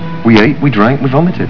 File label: vomited